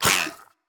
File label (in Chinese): 海豚攻击声